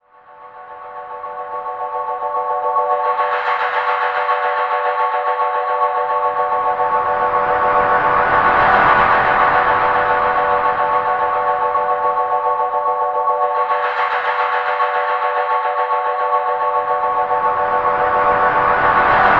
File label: Radar 2 E, PropellerDrones; Zero-G Seismic Frequencies